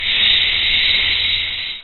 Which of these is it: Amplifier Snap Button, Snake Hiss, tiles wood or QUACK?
Snake Hiss